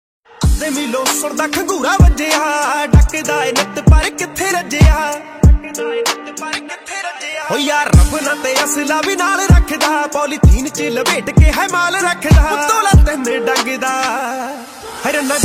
Punjabi Ringtone

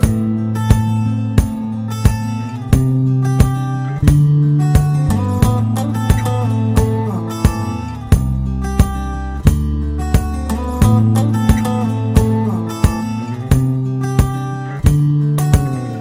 Guitar Tone Telugu Ringtone

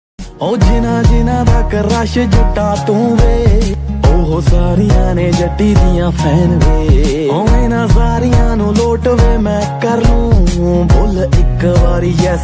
Punjabi Ringtone

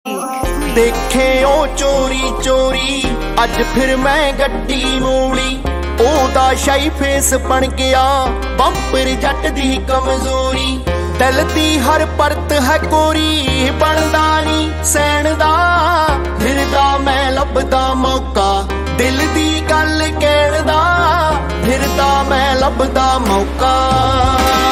Dance Punjabi Ringtone

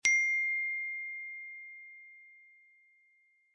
Trickbell Ringtone

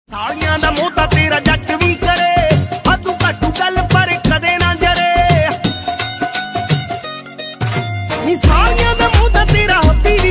Dance Punjabi Ringtone

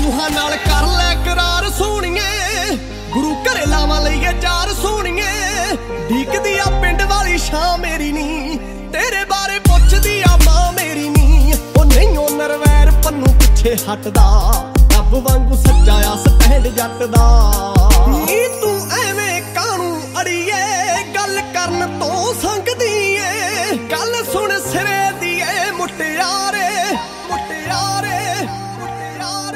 Punjabi Ringtone

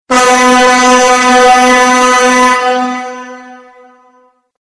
Train Siren Ringtone

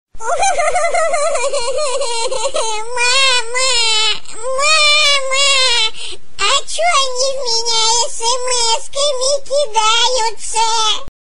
Funny Baby Crying Ringtone

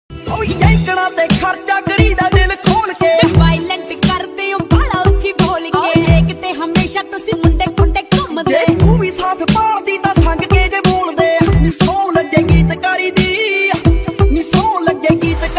Punjabi Beats Ringtone

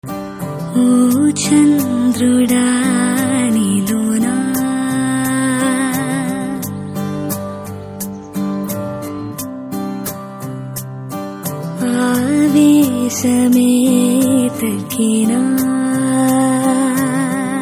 Telugu Ringtone

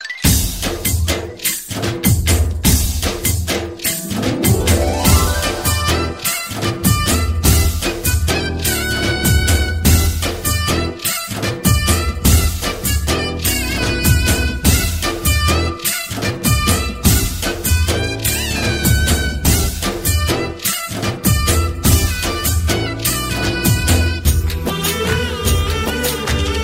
Instrumental Telugu Ringtone; Pournami

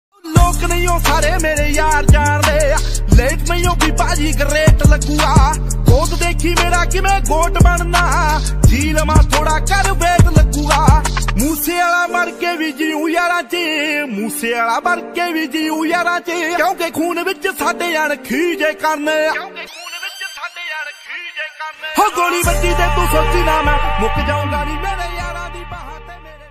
Punjabi Song Ringtone

